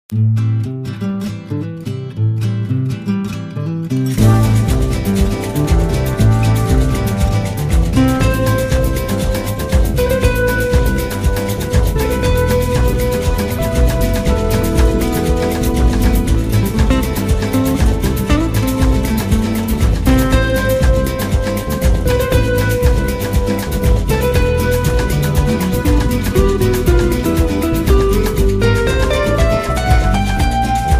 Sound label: Fiery Samba; Latin Samples